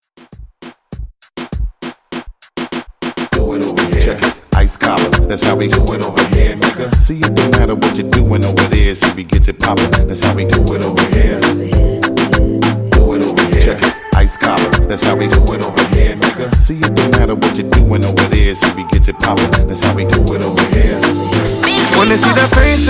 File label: Moombahton Redrum; Remixfreakz